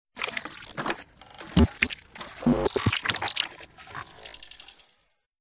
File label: science fiction steam punk machine operate clicks glitches; Audio Mangler